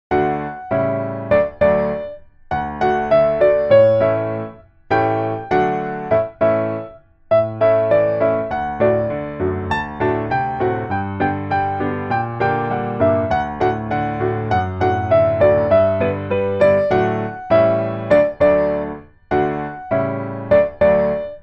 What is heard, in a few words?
piano